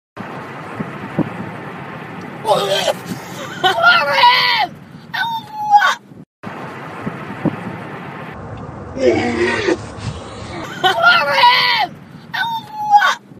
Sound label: girl gag on mcflurry spoon